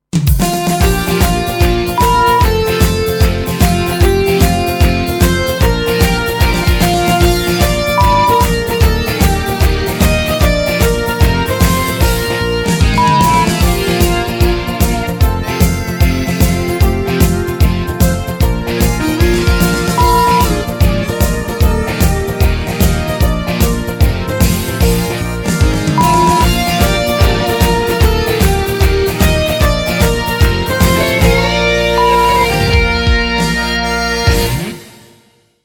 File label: Happy Birthday Rock 150bpm